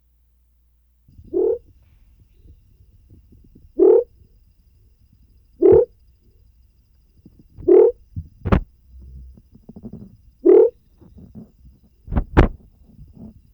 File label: Leptodactylus Knudseni; CENBAM; Res. Ducke